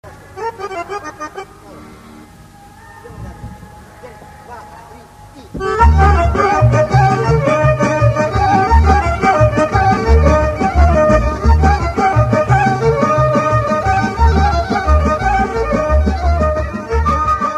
Kolo - Serbie; Vlasko Kolo

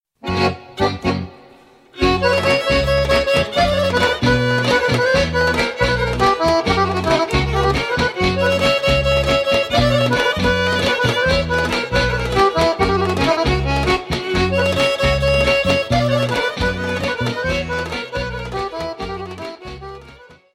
Horo - Bulgarie - Pirin; Satovcensko Horo

